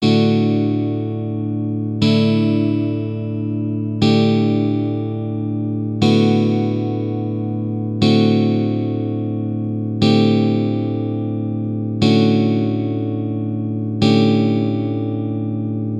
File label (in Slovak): odvodenych akordov D dur